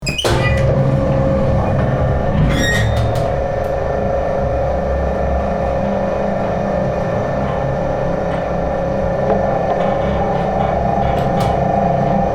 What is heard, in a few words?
laundry mangles